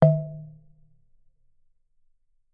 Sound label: ALT TEN E Eb FLANGE